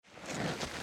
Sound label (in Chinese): 攀爬; Warcorrespondents